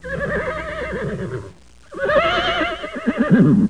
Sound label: Horse neigh distance